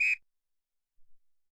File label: Whist Sht